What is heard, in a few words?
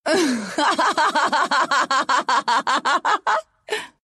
Pain laugh, ru; Dota 2